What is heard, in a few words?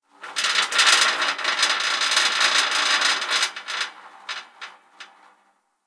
Gas City Rattle Metal; Ambience; Warframe; Corpus Gas City